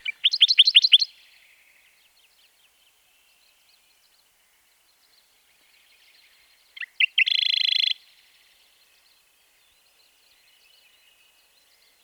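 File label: Radde's warbler voice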